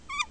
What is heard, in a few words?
fade; tuxpaint